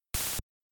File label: start